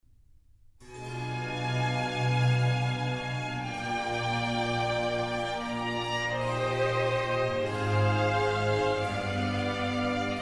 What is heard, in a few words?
Concerto for Strings in F minor; RV142; Antonio Vivaldi